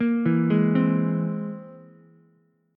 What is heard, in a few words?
C Augmented 7th, Ukulele; arpeggiated; JGuitar